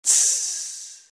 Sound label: zzz